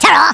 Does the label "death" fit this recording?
Yes